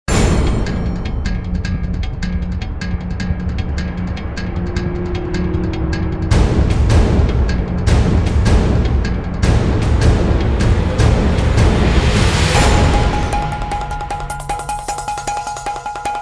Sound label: drums only version